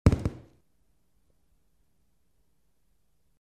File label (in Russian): podkoljuchenie mikrofona k usilitelju